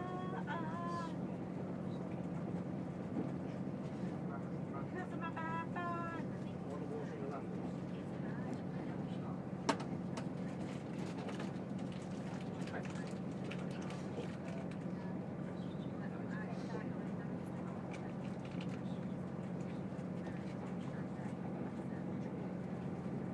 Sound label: train noise with occasional terrible singing